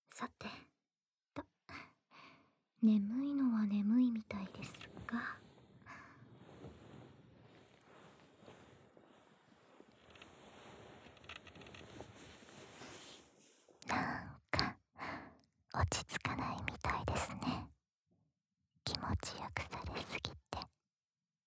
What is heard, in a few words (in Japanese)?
やさしい耳なめ（左）, ASMR; 暖炉なしver